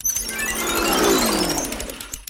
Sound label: click plane mobile; activity center; backyard; preschool time